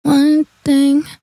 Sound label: One Thing Dry Abmi 98